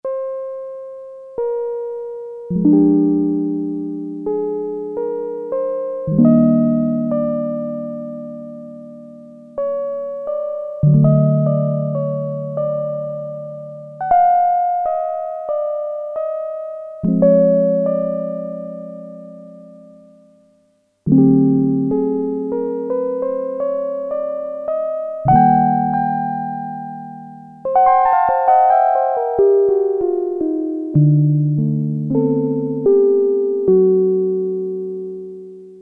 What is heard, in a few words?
Electric Piano